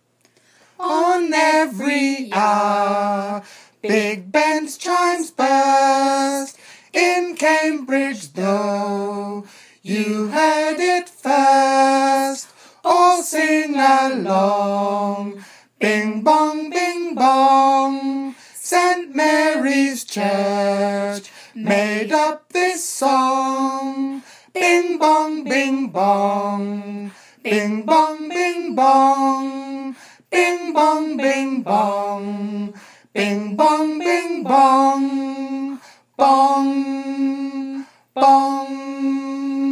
chimes song; great st mary s

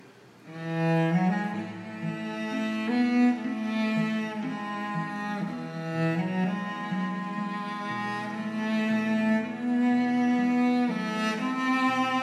in 3 part cello

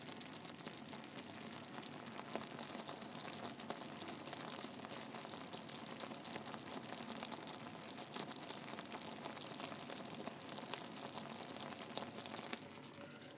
christmas noises boiling fudge

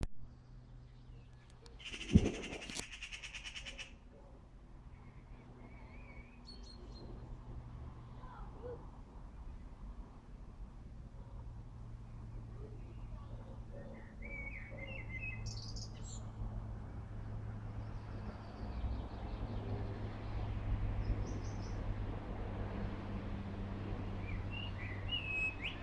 the delicate sound of urban evening birdsong

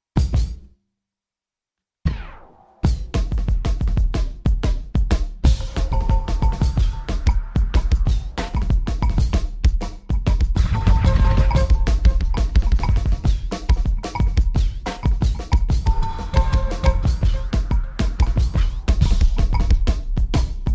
drum n bass with all live drums

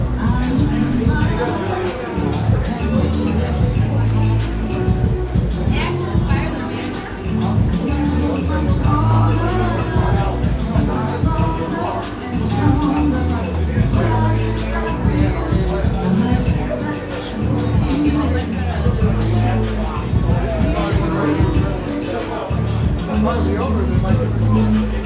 karaoke; images

